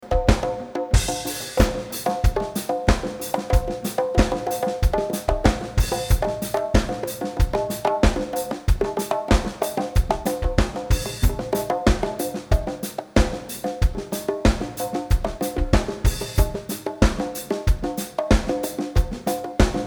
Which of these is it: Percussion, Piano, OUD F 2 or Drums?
Drums